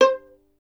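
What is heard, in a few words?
STR violin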